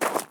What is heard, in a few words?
Footstep Gravel; HapticSamples; Feel; NiceVibrations; Footsteps